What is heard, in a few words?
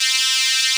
PWM HP80 C3; Analogon